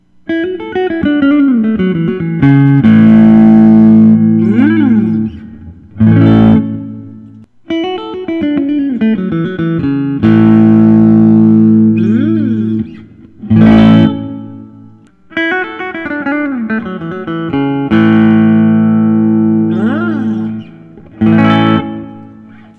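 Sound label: artinger semi hollow caramel burst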